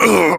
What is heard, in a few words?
Pain, Barks; Kuva Lich; Persona D